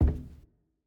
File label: Footstep Metal; Silco